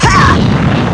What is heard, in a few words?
powerup; db mod; frieza